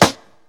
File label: acoustic snare sample f sharp key; snare drum one shots